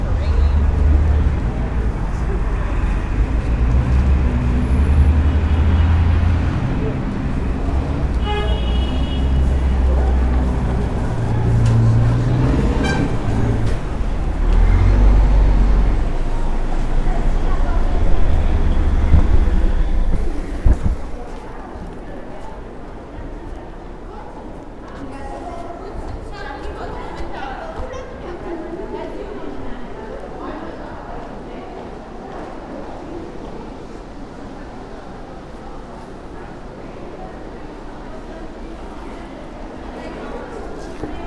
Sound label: hotel entrance